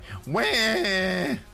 waaah

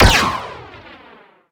pistol; officer

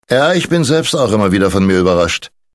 hurr

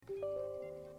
windows sound

